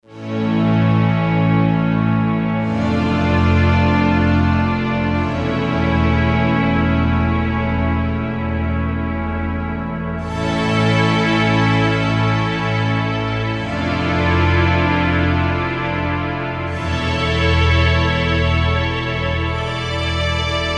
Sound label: Mello Swell, synth